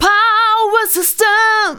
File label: vox, Techno Trance; Ueberschall